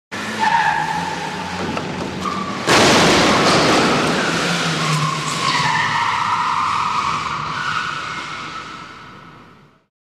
Car Crash, Car Peels Out And Hits Wall In Underground Parking Lot, And Fast Away.